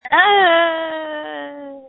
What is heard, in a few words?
screams